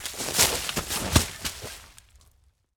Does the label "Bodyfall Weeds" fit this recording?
Yes